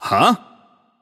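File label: nonverbal confused; voicepack; smite; merlin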